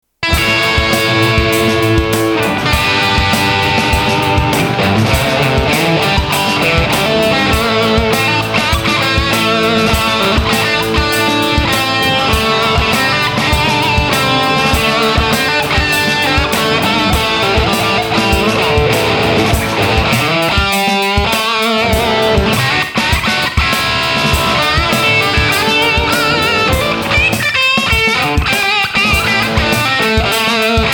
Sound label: guitar hackery in A; admiralbob77